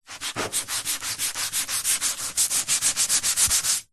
household sponge pad wipe clean wooden worktop kitchen; zapsplat